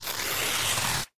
Cut Painting

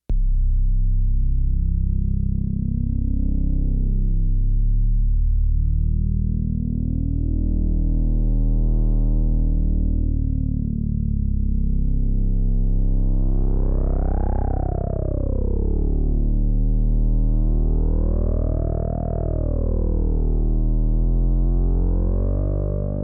Loading up Bass; sniper ghost warrior 3 gamerip 2017

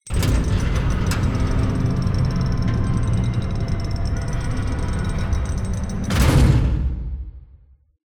gate

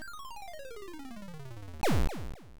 Miss; Pooyan; Arcade

